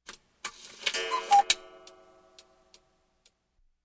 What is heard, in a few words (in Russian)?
budilnik kukushka, zvuk budilnika